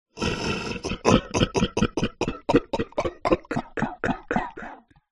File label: horror evil demonic laugh